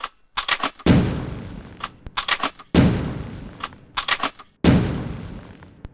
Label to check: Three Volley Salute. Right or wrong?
Right